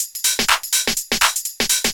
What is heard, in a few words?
124bpm, House Perc Loops